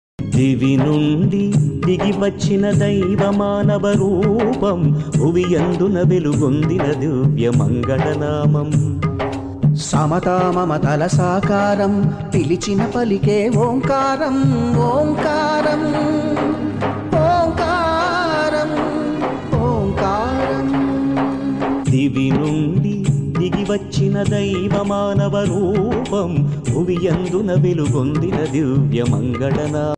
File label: devotional ringtone